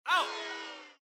dice; LU9000 Arena; Dice Game sounds; LG

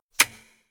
open flashlight; ZTE Nubia Z5S Mini; ui

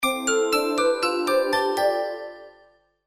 Classical Alarm; Sony Ericsson P900

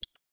Lock; Samsung Galaxy-S

